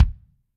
KICK, Drums Percussion; Kick 1 ModuIe

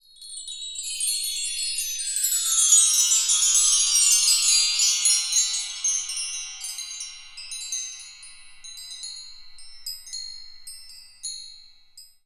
CHIMES, Transwaves; Soundengine - CSR-000 The Art of Transwaves

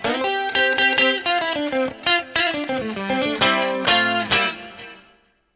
TWNSHIP, S.AFR.GTR

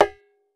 Tabla Baya; Roland BK-5; Percussion

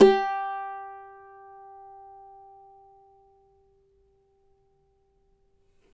CAVAQUINHO G, Guitars

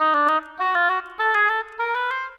Oboe, Orchestral; Sonic Foundry (Sony Creative Software); Orchestral VOL-2; Modern 18

